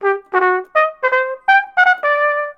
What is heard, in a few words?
Musical bugle reveille; lego star wars the force awakens 2016 ps4